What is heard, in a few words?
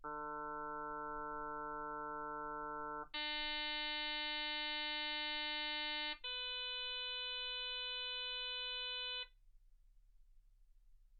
harm sinus 48kHz